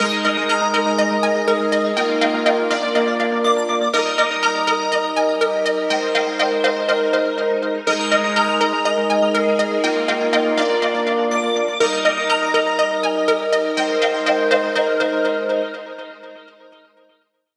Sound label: Samsung Galaxy A54